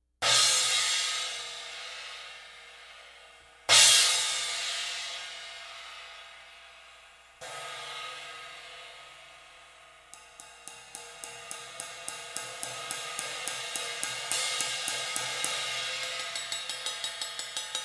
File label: 20 Paiste Swish